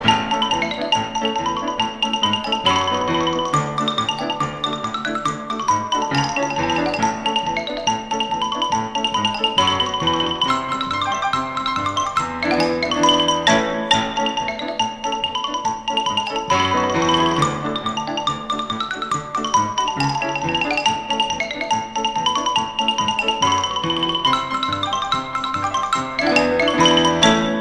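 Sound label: xylophone Carmen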